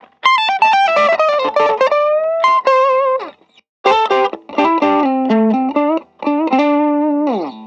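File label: Gliding Piano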